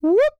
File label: Powerup